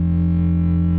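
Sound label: laser; KATANA; Daikatana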